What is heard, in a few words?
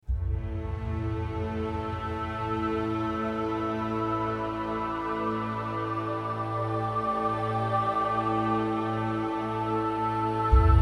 Dense Atmosphere; the universim official soundtrack